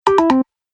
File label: Message Notify Sound, Dong